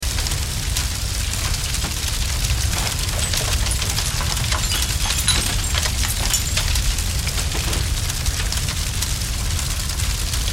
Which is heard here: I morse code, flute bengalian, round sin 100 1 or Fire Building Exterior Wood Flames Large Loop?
Fire Building Exterior Wood Flames Large Loop